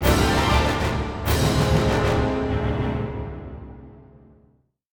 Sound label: defeated by neutral, music; Mount&Blade Warband